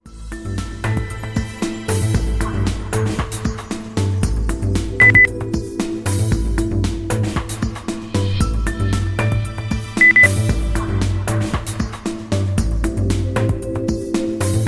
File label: lounge